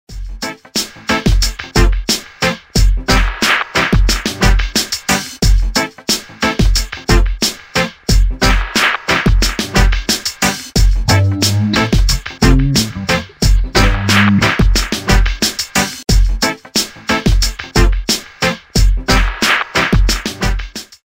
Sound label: Reggaebeat